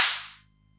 HatO, Roland MC09

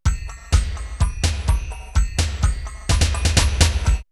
R8 Steady; drumloops; DrumMachines; X-Static Goldmine

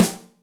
Roll Rim, Electric Snare, Yamaha DTXpress

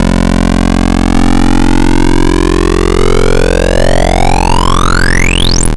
Apple II FX Laser Ramp

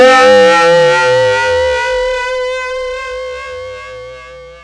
SYNC LEAD, MEMORYMOOG; Rubber Chicken Software Sample Bank

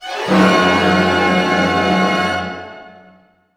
GLISS C MIN; Sound On Sound - Peter Siedleczek's Orchestra

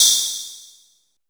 SPRAY PRC LO; TEK PERC2

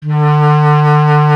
B. Clarinet

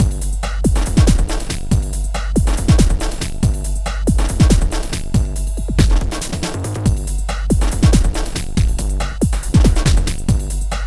Beat, Drums, Eclectic Electronica

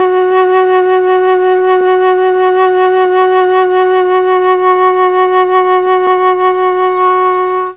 F#2 TRON FLUTE, Mellotron; Mike Pinder - Mellotron Archive CD

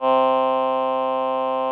VP-330, String Machines

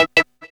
PULSE E; AMG; Global Trance Mission VOL-1

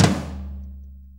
6-8 Tom Flam, Drum Loops

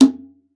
Conga; ProSamples vol.42 - Session Instruments

